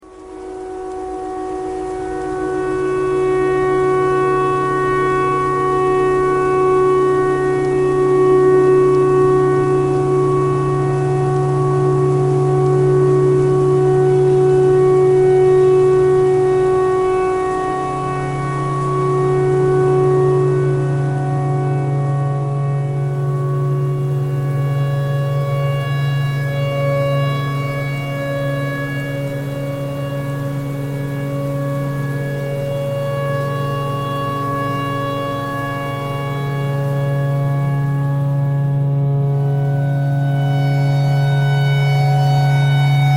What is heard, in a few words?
tornado siren